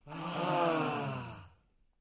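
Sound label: crowd sighs with relief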